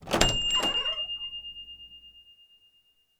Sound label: door open; meguriai; anemoi